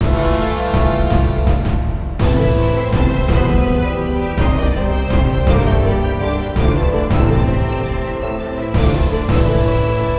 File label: Sting Greek Battle; Age of Empires Mythologies; NDS